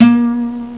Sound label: Yamaha Guitar; MetroDemo 2.5